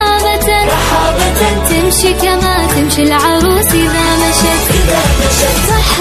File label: arabic ringtone